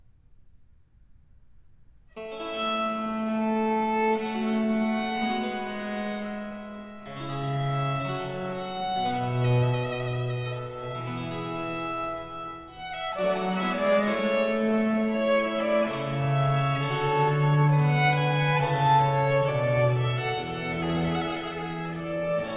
Sonata a Tre in B flat Major for 2 violins and continuo Grave; Caldara; opus 1 No 4